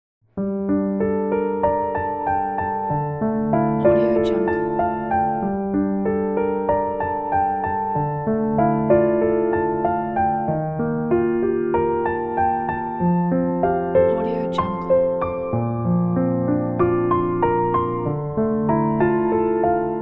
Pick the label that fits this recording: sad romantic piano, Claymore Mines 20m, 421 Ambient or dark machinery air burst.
sad romantic piano